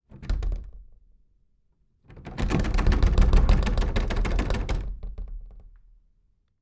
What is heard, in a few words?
Door Rattles Wood